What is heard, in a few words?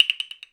Wood, Percussive FX; musicradar; cartoon caper